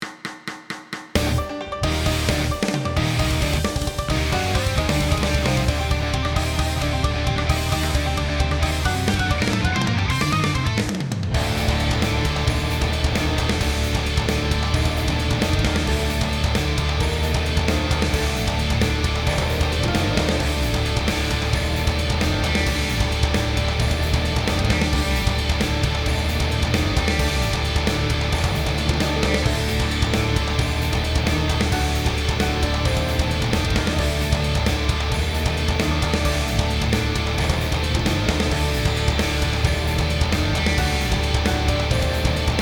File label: backing track; G.O.D. COMPETITION素材